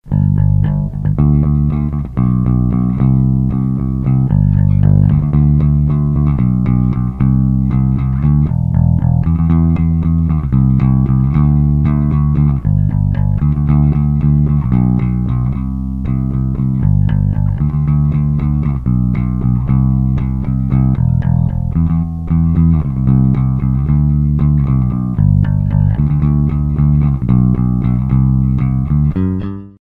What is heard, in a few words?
Rock; Bugera